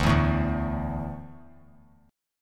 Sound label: D Suspended 2nd D down; strummed